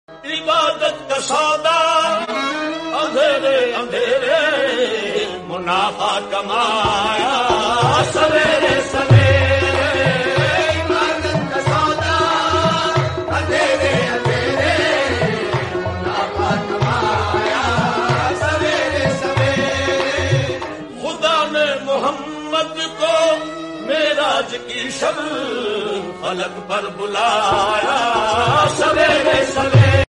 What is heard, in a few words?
islamic song